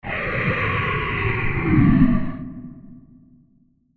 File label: Absorptive Transition; Scary Soundscapes; Horror